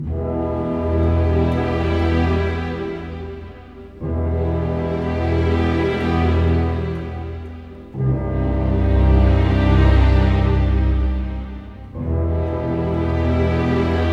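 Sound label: 60 dark, orchestra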